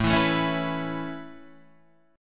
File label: A Major Add 9th A Guitar Open D, chord